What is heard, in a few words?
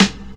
CLAP, Big Beat; Big Beat Samples & More; AKAI; CLAP KIT